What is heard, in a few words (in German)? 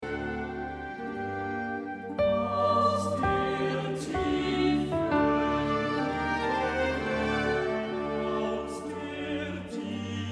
Bach Aus der Tiefen, Sopran